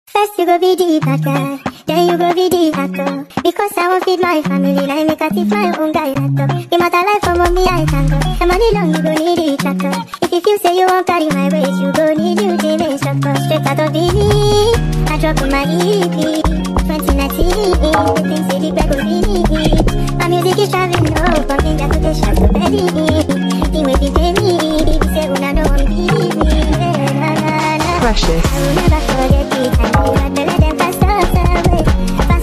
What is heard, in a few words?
Afro Drill Refix